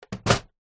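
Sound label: electronic typewriter set down on wood desk; office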